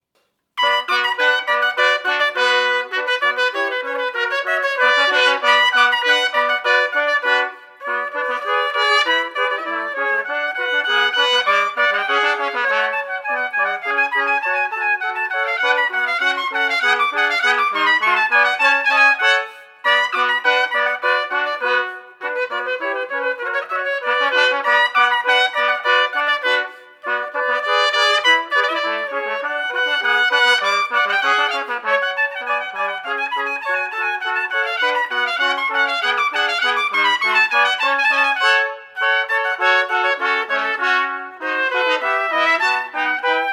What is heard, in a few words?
Orchestral Suite Badinare; Orchestral Suite No. 2, BWV 1067; Music of J.S. Bach for Trumpet